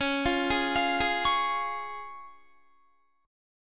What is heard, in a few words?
Db Major, Guitar; arpeggiated; 20,20,20,22,20,21